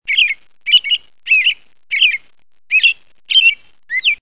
American Robin

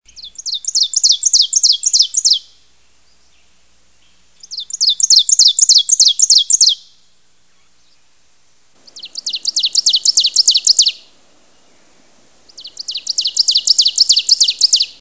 ovenbird

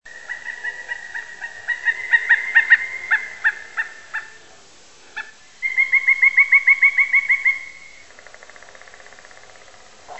pileated woodpecker

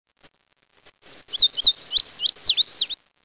indigo bunting

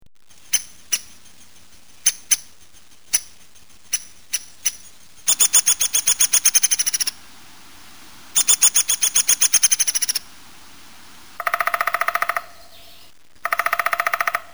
downy, bird sounds

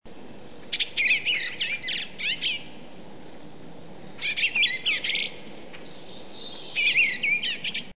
bluebird

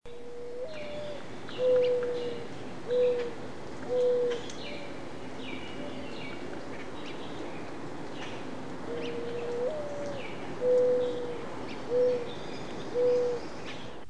mourning dove

